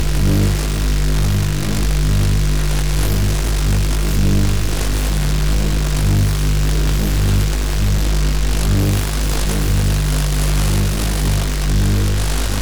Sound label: Energy - force field loop